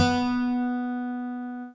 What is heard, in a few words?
lyra; theodyssey